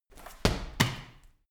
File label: medicine ball wall throw, fitnessstudio